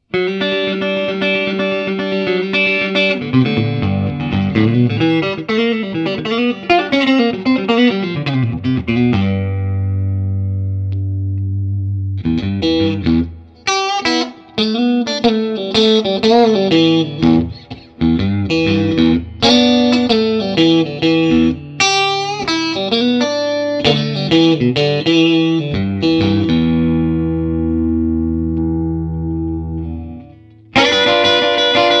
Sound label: Fender